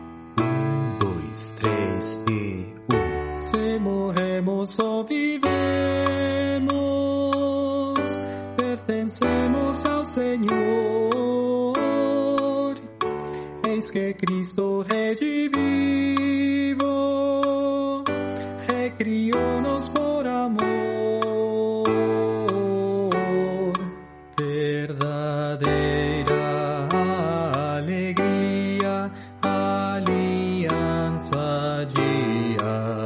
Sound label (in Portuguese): baixo